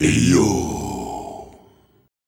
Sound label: Male Voice, African Voices; Quazibeat - African Secret